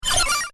Future Communicator Device, science fiction sound effect